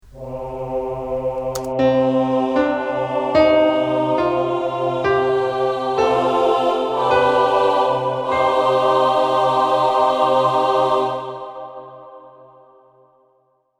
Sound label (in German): melodisch Moll